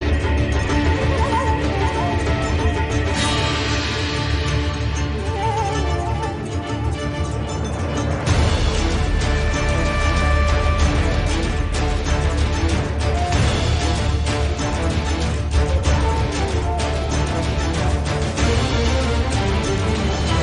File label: music; Kurulus osman